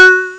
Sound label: ding bell, Amiga Soundtracker; ST-XX Amiga Soundtracker Sample Packs